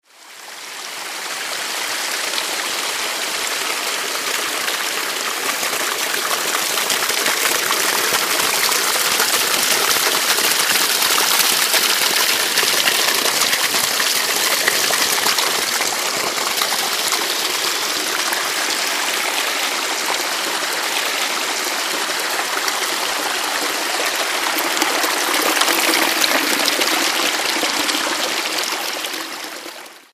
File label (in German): natur